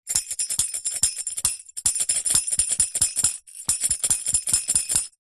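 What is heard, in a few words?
musical percussion tambourine kids rhythm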